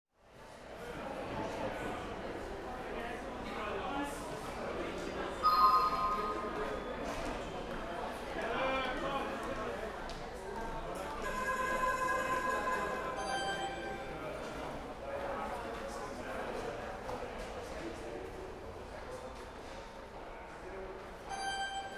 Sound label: LOBBY AMBIENCE; music